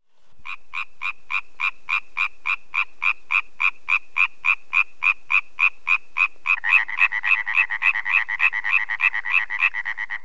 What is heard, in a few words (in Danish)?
løvfrø